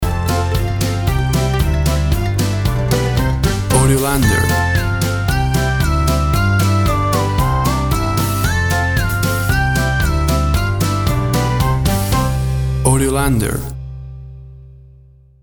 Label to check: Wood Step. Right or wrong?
Wrong